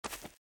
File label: grass; alternativaplatform; alternativa3d v5; temple